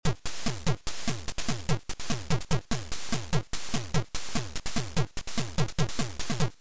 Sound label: Drum; Drum4devochka